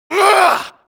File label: mohole dfa hit effort